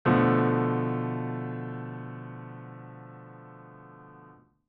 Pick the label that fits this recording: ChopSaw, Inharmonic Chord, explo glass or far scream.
Inharmonic Chord